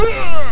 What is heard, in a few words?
hurt; sigurdrshavoc